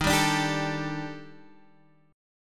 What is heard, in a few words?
D sharp Major 7th Flat 5th, down, Guitar, Half Step Down